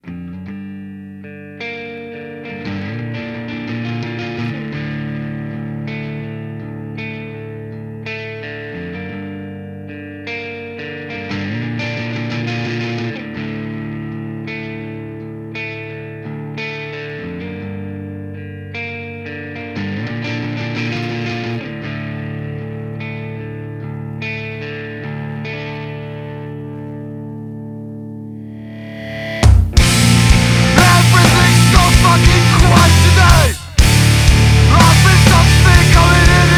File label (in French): Old school hard core Dijonnais avec un gros son et de l'énergie; YouFail; Whales